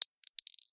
weapons bullet casing; FMOD; Bullet Casings

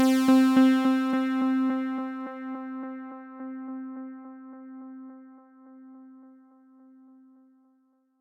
music bleep c

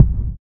explosion distant; Weapons; FMOD

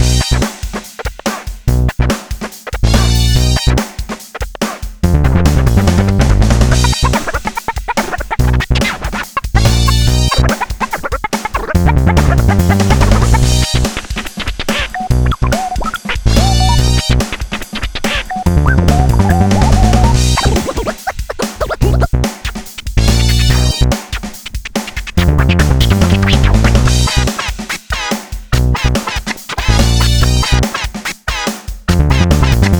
music radio station hiphop loop

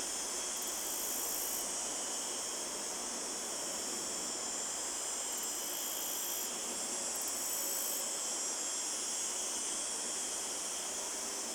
ambience evening loop

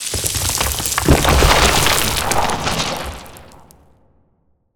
Falling Platform; FMOD

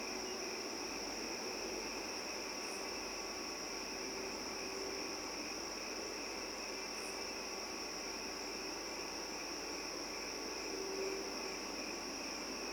amb night loop

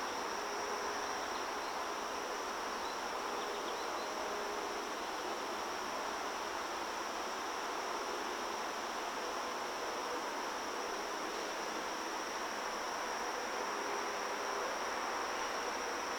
amb morning loop

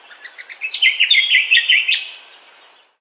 amb bird call; Ambience; FMOD; birds

